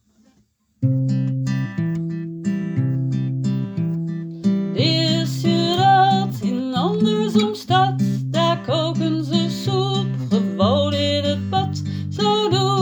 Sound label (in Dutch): liedje